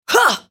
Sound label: ultimate effort; Diana